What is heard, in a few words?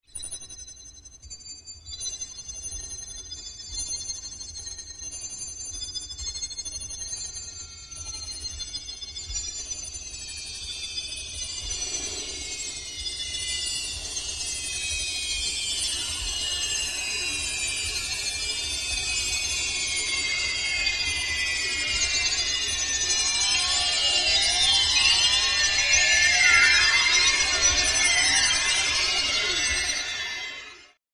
Multi-Pitched High Frequency Transformation Sequence; Electronic Sci-fi